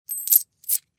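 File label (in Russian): несколько монет подбрасывают в руке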